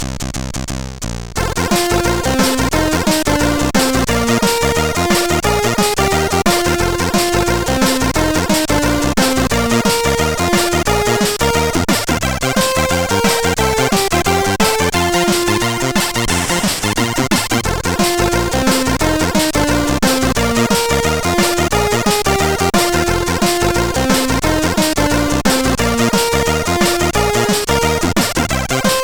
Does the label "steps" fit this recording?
No